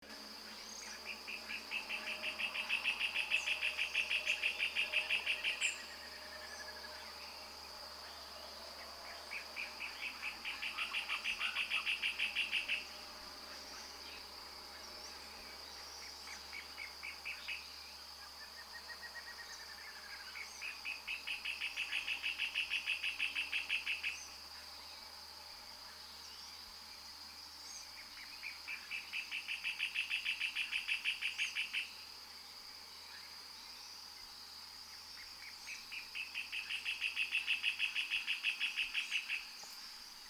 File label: Pale legged hornero